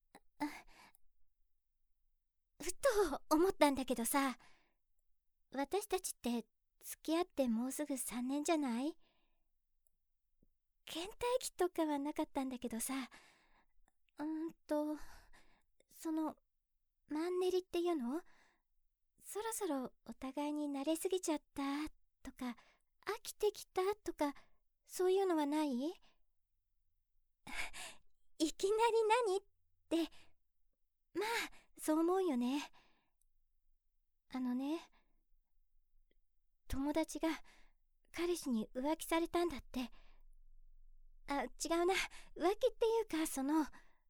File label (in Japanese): ぬるぬるローションでマッサージ; 風俗ごっこ; 声☆性☆制; 纯爱/甜蜜 情侣 萌 正常玩法 口交 黑发 风俗/泡泡店 AI